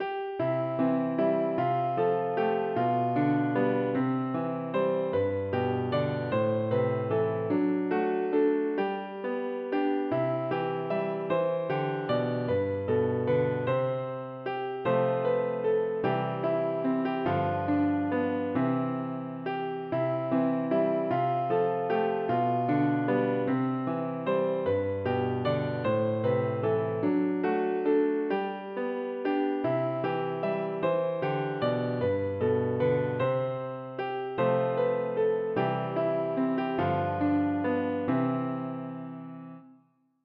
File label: piano